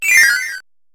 Door enter

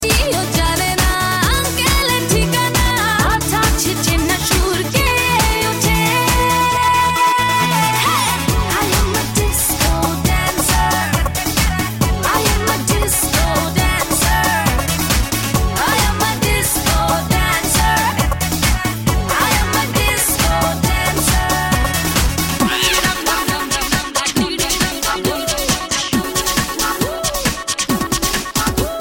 Disco; Disco-bandor

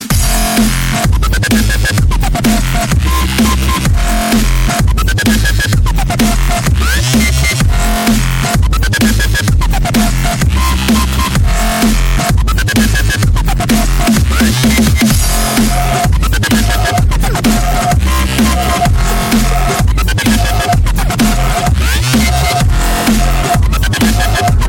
Dubstep Ringtone

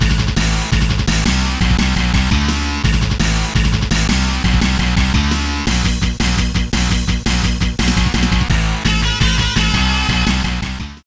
Riff

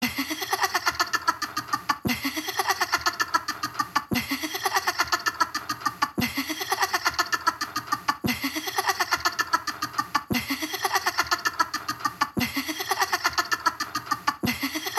Laughing

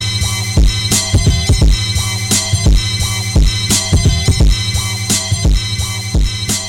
Hip Message

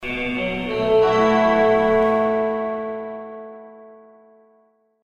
Bling Bling Message

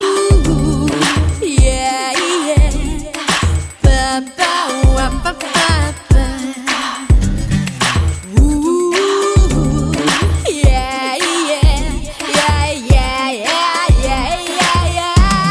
Ringtone; Maatran

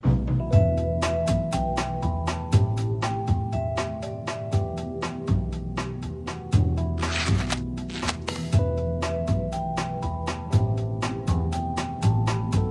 Elevator

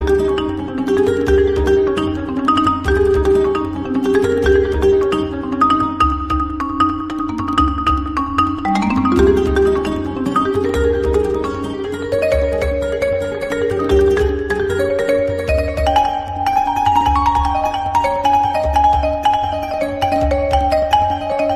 Marimba

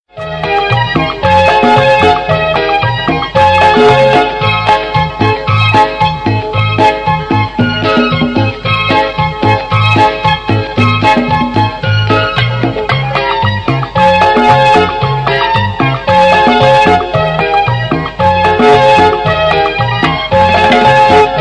Cumbia

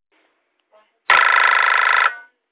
Reed

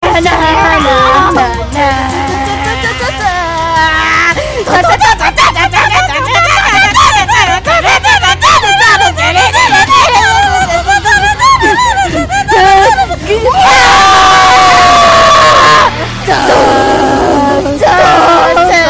screaming; voltron

